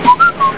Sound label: whistle